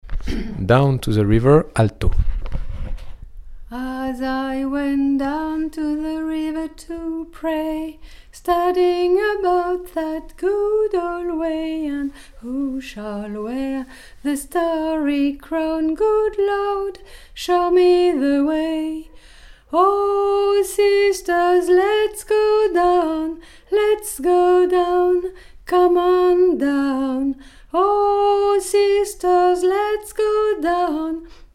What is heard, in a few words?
Down to the river alto